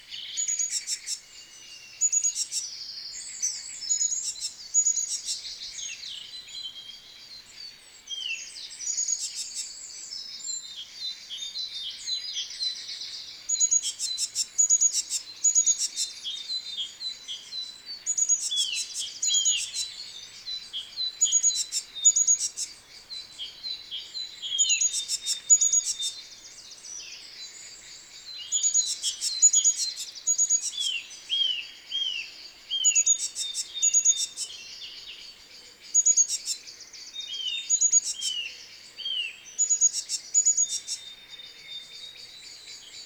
Parus major; SETE

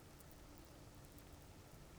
pulses, BALAENOPTERA; MONACO 2022-07

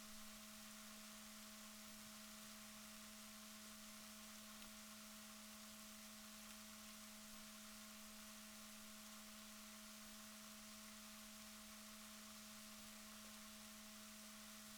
rorquals positif, Balaenoptera Physalus Chile

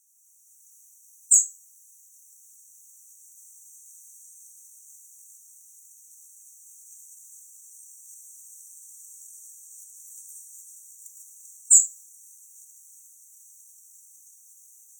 Erithacus rubecula; SETE

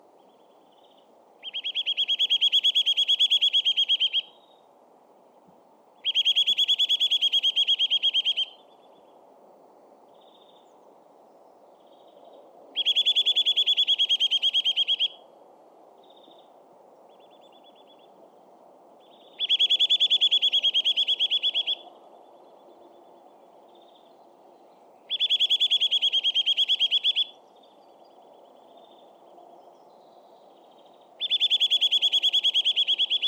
Sitta europaea; SETE

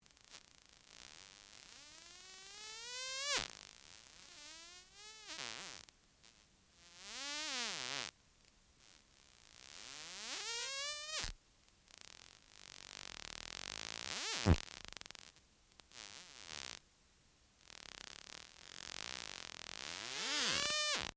Rissos, Voices in the sea

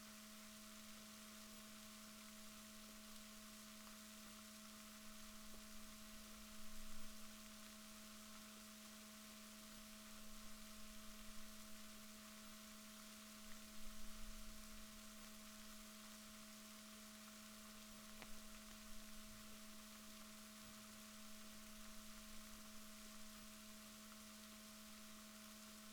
rorquals negatif, Balaenoptera Physalus Chile

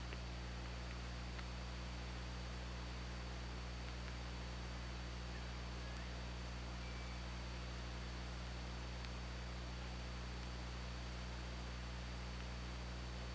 OrcaLab

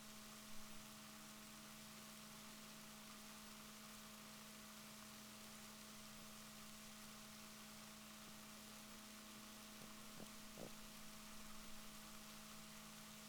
rorquals positif, Balaenoptera Physalus Chile

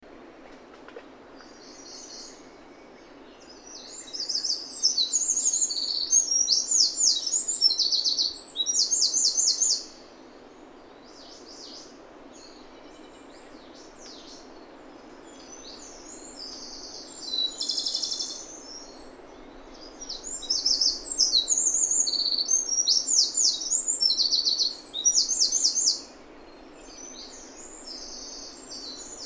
Troglodytes troglodytes; SETE

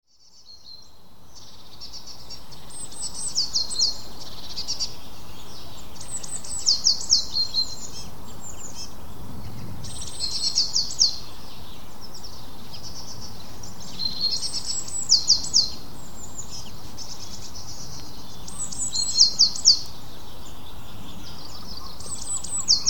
Cyanistes caeruleus; SETE

